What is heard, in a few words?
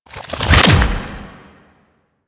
Octopede Limb Attach; Warframe; Man In The Wall